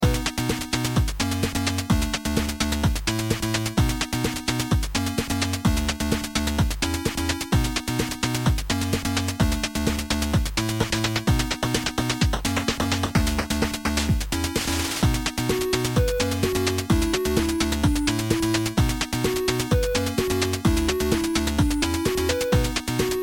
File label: beat